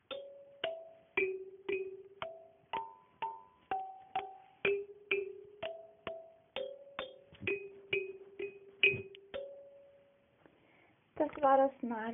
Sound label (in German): Nagelklavier